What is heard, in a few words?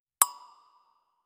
clean can to can click, metallic